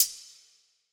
hat spacey, Closed Hat; Hip-Hop; Drum One Shots; SD CAICOS Hip-Hop Sample Pack; Slate

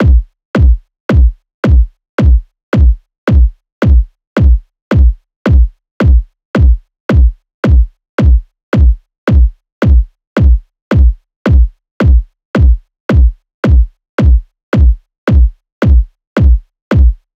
kick 110, Kick Loops; Drum Sample Pack; SD SEISMIC; Slate

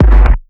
Kick Asteroid, 808 Kicks; Slate; Vintage Drum Machines Volume 2 808 Edition

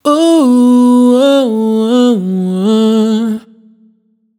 riff ooo wah A wet, vocal; Slate Digital Omnivox Vocal Sample Pack

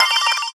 tonal oneshot spin F; Pop; Slate; PASADENA Pop Sample Pack

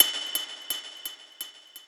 perc ping pong; one shots; SEISMIC Drum Sample Pack; Slate

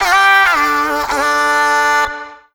phrase Bb, Vocal; Slate Digital Omnivox Vocal Sample Pack

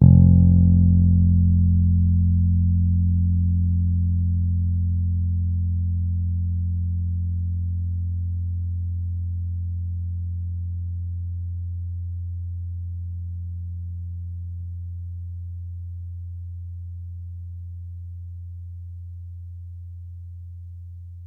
Bass Long F, Electric Bass One Shots; Slate Digital BASSHOLE Sample Pack

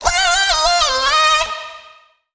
Vocal Sample Pack Phrases Cm; Slate Digital Omnivox Vocal Sample Pack

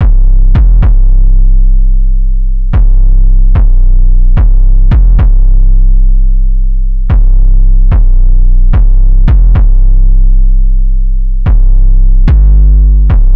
808 loop 110 C; Slate Digital BASSHOLE Sample Pack; 808 Loops

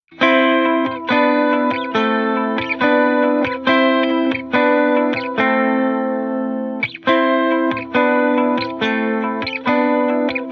guitar loop 140 Am; Pop; Guitar Stacks; SD PASADENA Pop Sample Pack; Slate

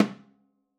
timbales High Mute; Raw Perc One Shots; Slate; SD SEISMIC Drum Sample Pack

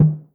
Tom Mid Wide, 808 Toms; Slate; Vintage Drum Machines Volume 2 808 Edition

